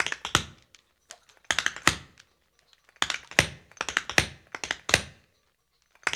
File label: African Tap; Spectrasonics; Supreme Beats